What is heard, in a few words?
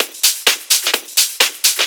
Discohouse Loop 128 BPM; Best Service; House Definition VOL-1